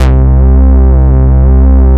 Bass Note C

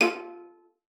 F4, VIOLA FINGER; Xsample VOL-5

